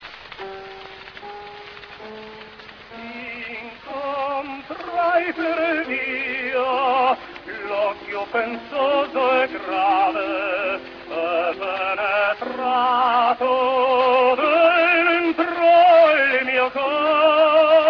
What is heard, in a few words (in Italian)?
bassi